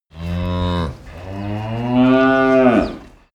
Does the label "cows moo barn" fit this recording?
Yes